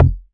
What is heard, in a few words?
BAS F1, Yamaha TX81Z synth bass